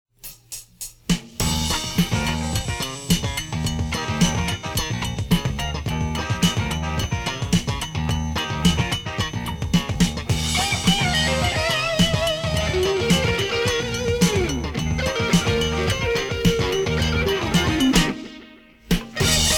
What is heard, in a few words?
instrumental